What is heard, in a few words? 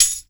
Tambourine